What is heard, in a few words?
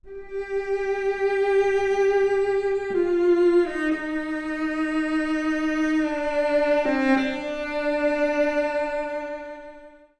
cello, anechoic; instruments